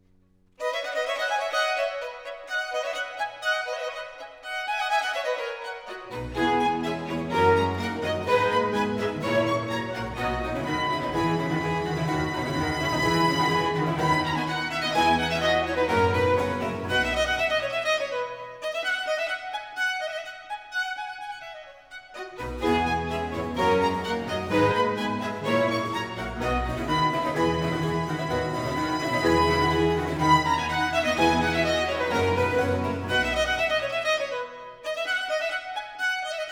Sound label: violin, strings & continuo; Allegro; C major, RV 175; Vivaldi; Concerti per Violino